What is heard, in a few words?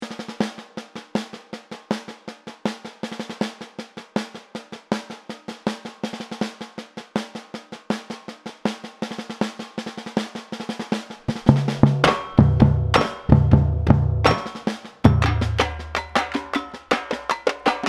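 break, samba; Pirate Samba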